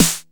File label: 909 SD, TR-909